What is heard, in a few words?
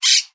chirp